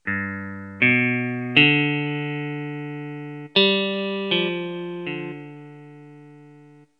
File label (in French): Guit elec; MOBICLIC 14